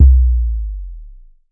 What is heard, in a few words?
808 kick; Hip Hop; Hip Hop Specialty Kit; style kits